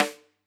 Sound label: close Rim, drum